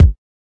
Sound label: kik